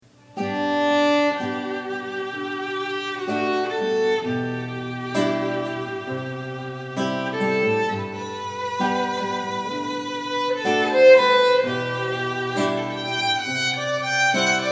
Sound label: violin and guitar; Jessica Haddy